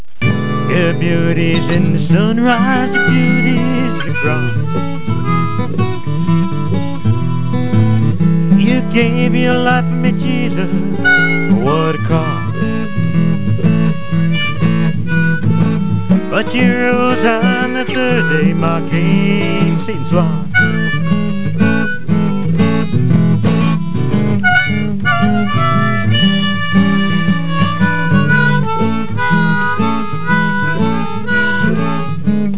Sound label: Blues